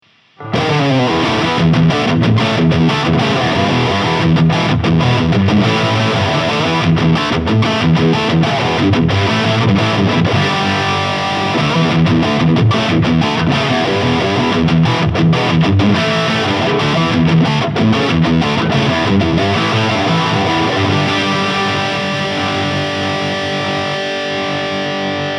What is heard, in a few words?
Soldano SLO 30 Lead